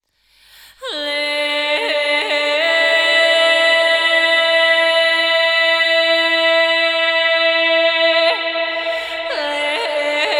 Hey Vocals - Fm 60Bpm (WET); Construction Kits; Ghosthack; SkyRealm; Kit 04 - High Rock View

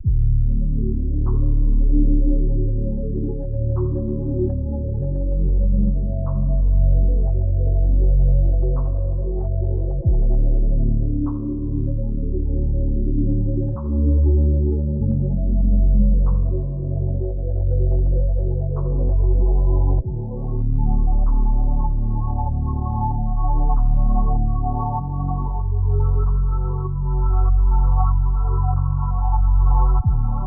96 BPM F# Min, RnB; Break Away

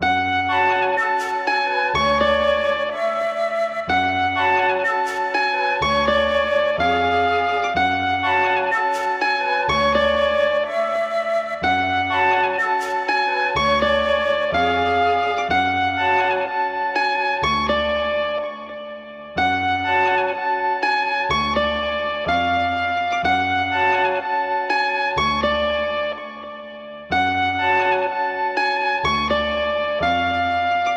124 BPM F# Min, melody

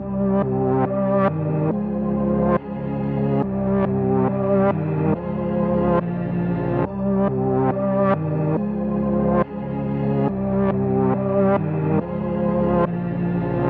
140 BPM F Min, Melody Collection - Reverse; Cymatics; 2022 Melody Collection

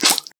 smack, Cartoon impacts and smacks

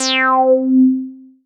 LEAD Melt (C), Melodic One Shots; Cymatics; ANALOG EVOLUTION Beta

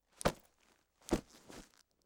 Soft Suitcase Down; Fairlight Sound Lib

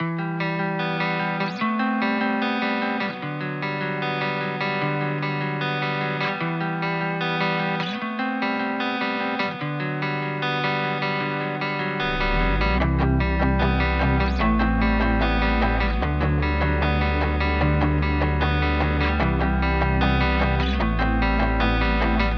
150 BPM G Maj, Guitar; Unexpected; Cymatics; Miami Guitar Pack - Pt 2

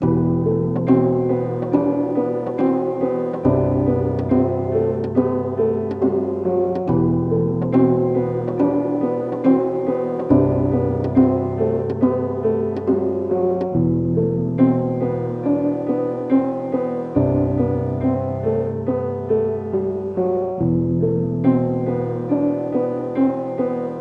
140 BPM E Min, Melody; Cymatics; 2022 Melody Collection Platinum Expansion

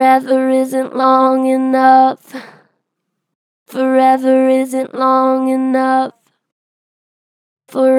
Str Flat, Pitch Flat Strong, Vocals; Harmor Vocals

